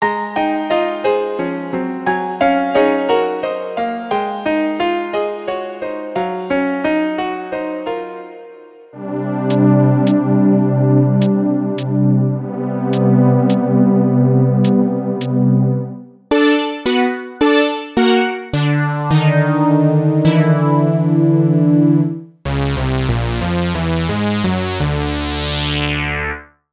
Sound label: K2500; Pikmin 2